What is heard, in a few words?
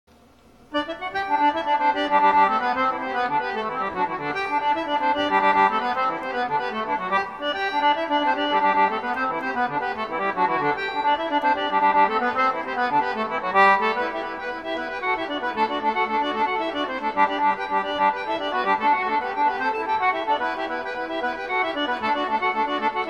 jig, concertina; English concertina